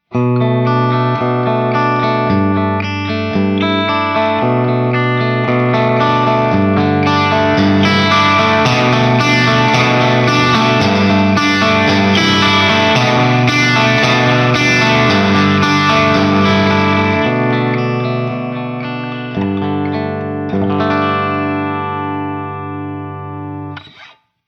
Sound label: hot retro, Humbuckers, guitar